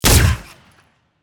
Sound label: WEAPON GUN GUNSHOT FUTURISTIC; SCI-FI Gunnery; PMSFX